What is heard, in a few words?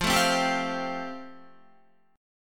F Major Add 9th down, Fadd9 chord, Guitar